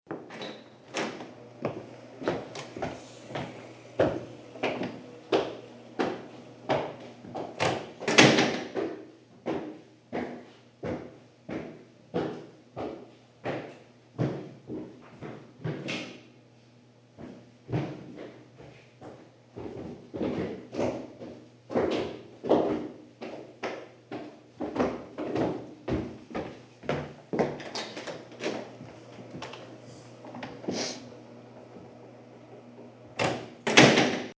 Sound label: Stairs